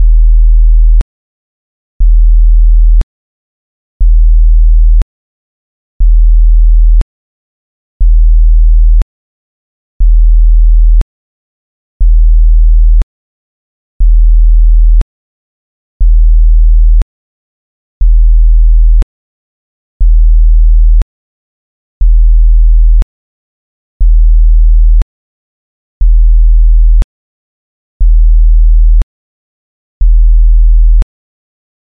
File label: 40Hz Sinus Burst